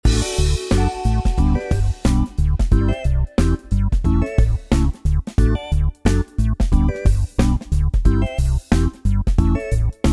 Game Loop; Sokoban Next

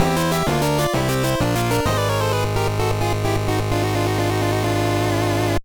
Battle Mode - Draw!; bomberman tournament gba

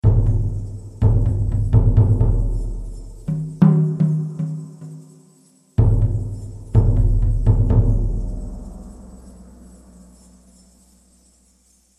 combat music stage 2; Might and Mayhem

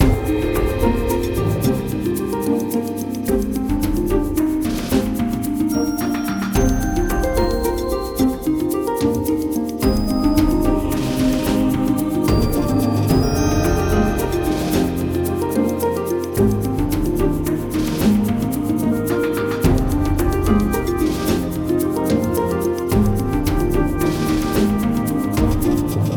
Garden Idle LOOP

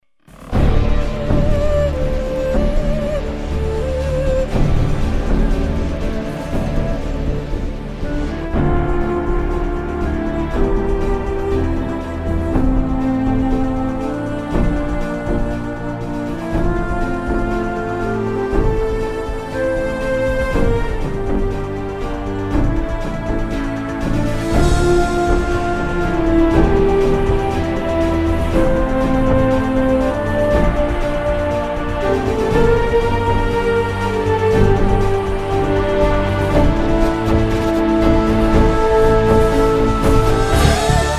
Music Menu

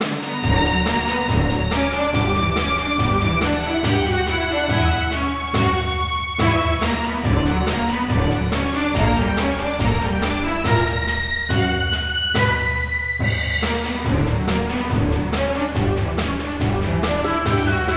fight bandits; dark deity

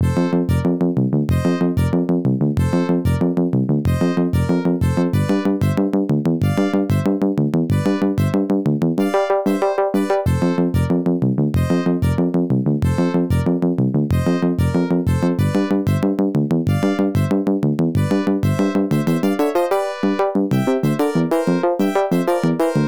Unused BGM

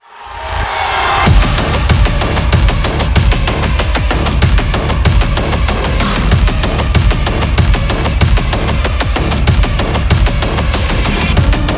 Main Heavy; Doom Hunter Base - Main Heavy 14

